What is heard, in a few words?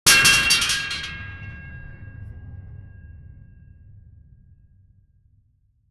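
long harbour metal ship horn; ships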